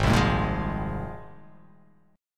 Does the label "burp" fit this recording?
No